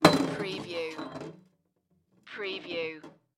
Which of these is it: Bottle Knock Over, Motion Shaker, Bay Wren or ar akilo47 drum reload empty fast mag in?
Bottle Knock Over